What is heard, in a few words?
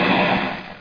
GUN; shootr